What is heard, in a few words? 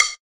noteblock stick; VoxeLibre; REDSTONE; mesecons